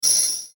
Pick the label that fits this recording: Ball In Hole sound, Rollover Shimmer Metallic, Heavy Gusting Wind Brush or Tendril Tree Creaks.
Rollover Shimmer Metallic